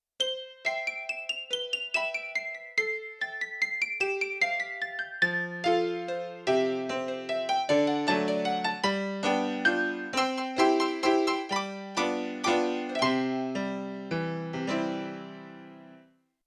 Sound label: Classical Grand High; Yamaha TX16W; Yamaha CFIII Grand Piano